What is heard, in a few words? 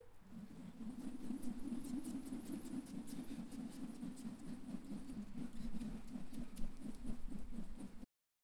Rope Being Swung